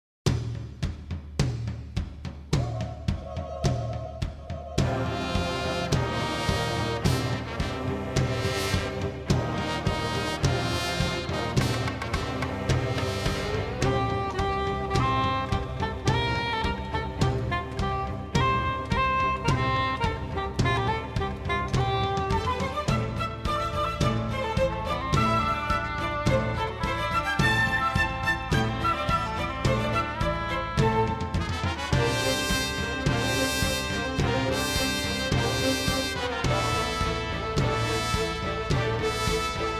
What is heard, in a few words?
Indian Drum